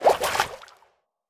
鱼游泳声

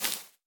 蜘蛛网破坏声

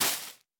海绵踩踏声

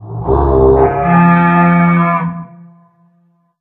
角庆祝声; 掠夺者

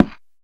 嘎枝之心放置声

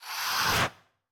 旋风人吸气声

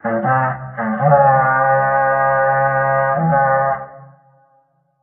袭击号角声

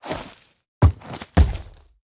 嗅探兽挖掘停止声

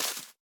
杜鹃树叶破坏声

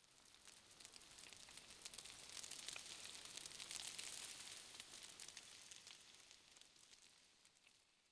玄武岩三角洲碎石声; ambient

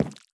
已涂蜡告示牌交互失败声

